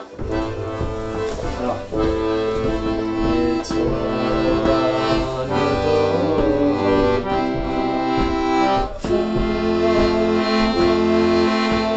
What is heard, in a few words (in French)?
Polka chanté; Nane Tsora